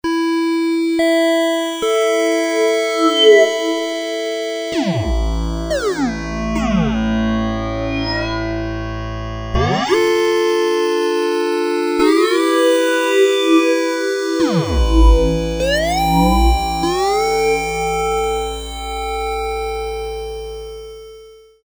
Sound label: legato, ensoniq asr 10